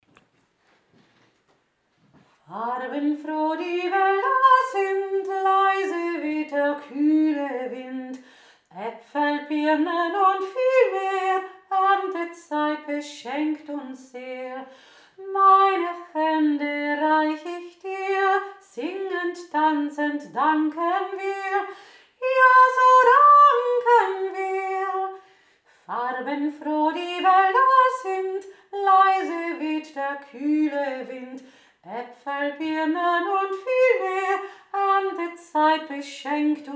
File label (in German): herbstlied